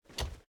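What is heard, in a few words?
footstep armor; smite; kuzenbo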